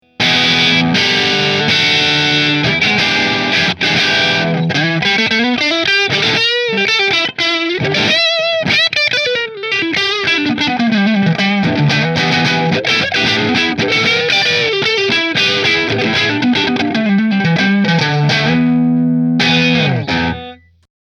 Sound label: Nola Model 8 Neck Marshall, guitars; New Orleans Guitars Model-8 Natural Finish